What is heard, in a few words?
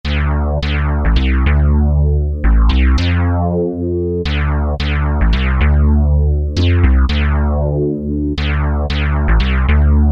Rogue Bass, Alesis Z2 EuroDance